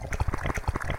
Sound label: Wet Meat